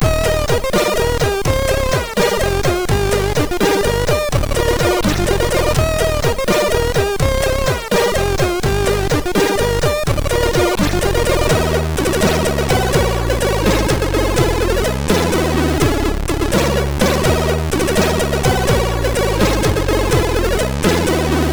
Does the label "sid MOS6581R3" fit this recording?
Yes